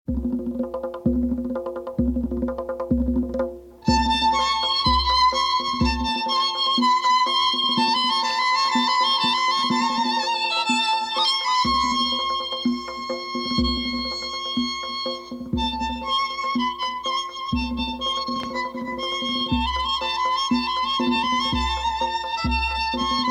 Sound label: Pishdaramad Violin; Dar Zahirodoleh